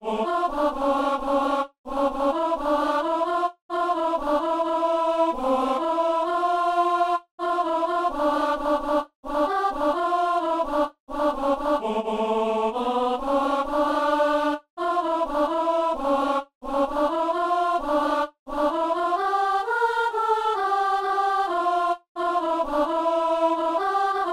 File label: alto